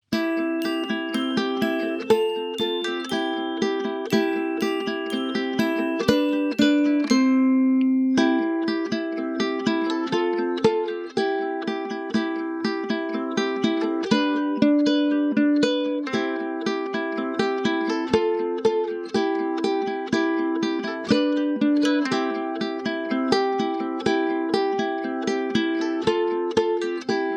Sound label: god is with me day and night backing